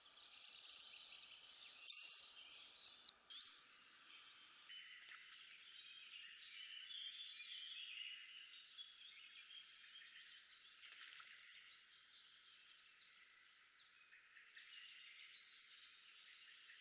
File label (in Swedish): Kungsfiskare